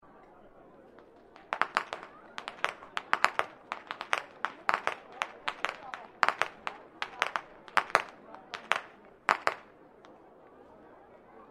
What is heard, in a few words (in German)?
schnalzen, jugend